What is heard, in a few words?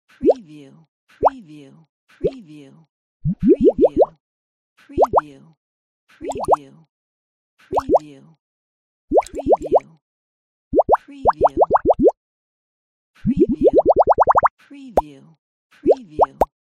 cartoon bubbles